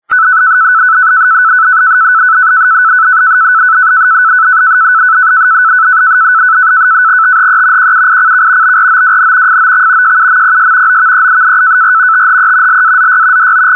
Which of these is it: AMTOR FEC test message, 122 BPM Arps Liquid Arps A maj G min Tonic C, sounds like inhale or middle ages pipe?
AMTOR FEC test message